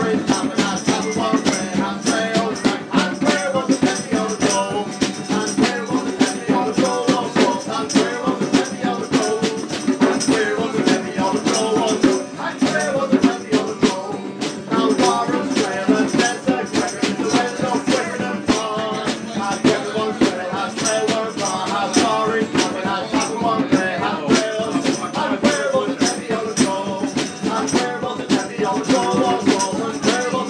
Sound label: cornish song at guldize in penzance